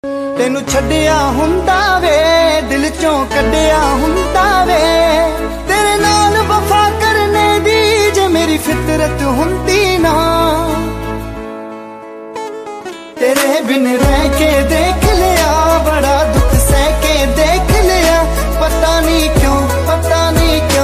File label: Sad Punjabi Song Ringtone